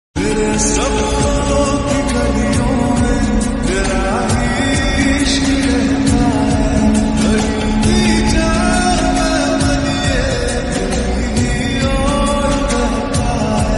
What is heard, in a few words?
slowed reverb ringtone lofi ringtone